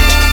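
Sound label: STACK BRASS, Ragga & Dub Reggae; RAGSTACKS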